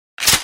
m870 pull, guns

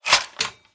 dp28 switch, guns

